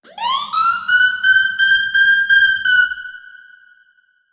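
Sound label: alarm siren, reverb processed, 170bpm; musicradar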